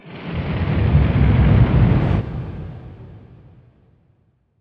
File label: NOISE, Production Effects